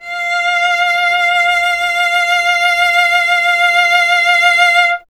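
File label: strings cello F; Miroslav Vitous; Solo Instruments VOL-1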